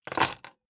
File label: key ring large place table hard